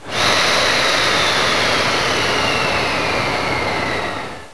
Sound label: Brake EF65